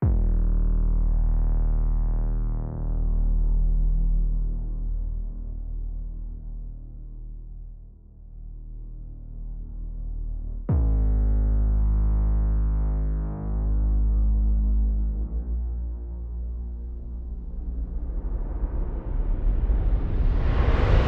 Groove And Bass

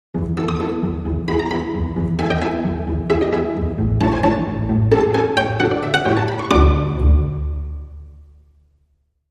Clean as a Whistle, Long Sting

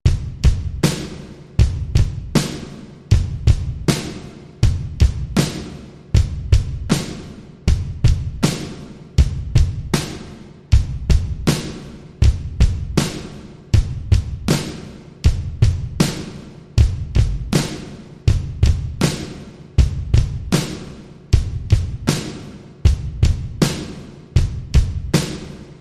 Drum Only

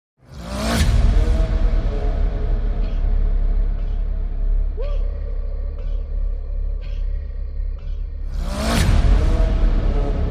No Drum Kit

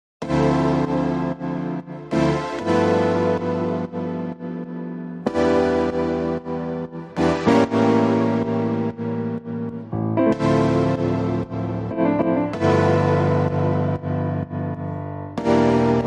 Piano and Synths Only

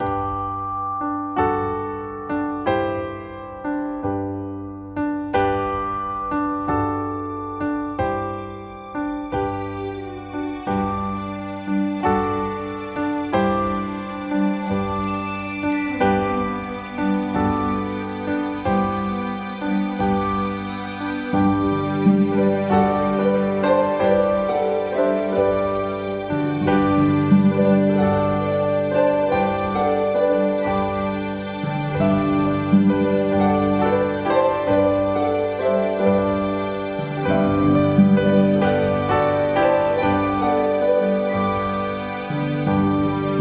Chime Cycle

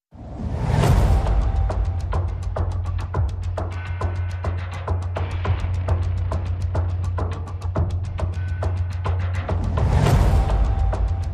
Percussion Only

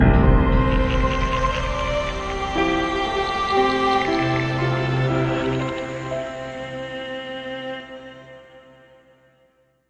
Sonic Dark Then Bright

